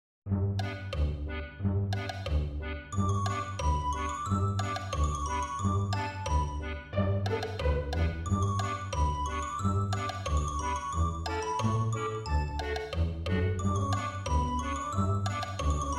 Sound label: happy